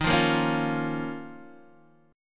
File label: D sharp 7th Suspended 2nd, Guitar, Open D